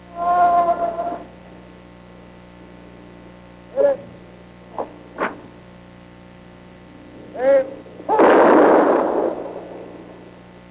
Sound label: taps